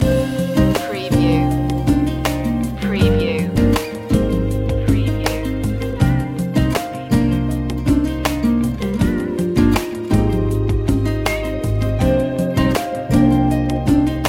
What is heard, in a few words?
mellow mood flash loop